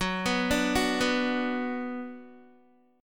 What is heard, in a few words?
F sharp Suspended 4th Sharp 5th, Guitar; arpeggiated; F#sus4#5